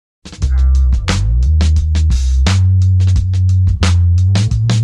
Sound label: Drum Machine